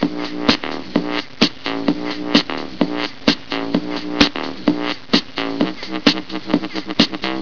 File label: flat beat